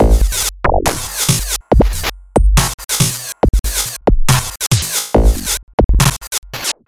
Glitch beat

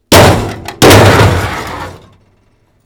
Big Metal Side Impact

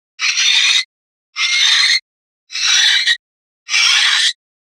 Short Metal Scrape Like Car Body Scratch

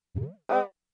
Bass Hit Robot Clown

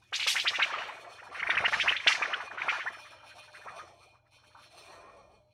Swords Hip Hop C

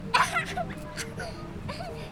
Child Laugh

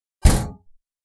Storage Heater Hit

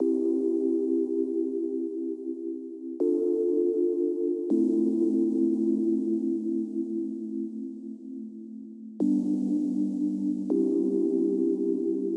Chill Background Music

